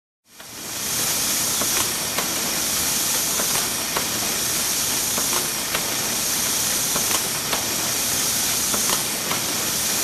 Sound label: Industrial Planer Machine with Heat Press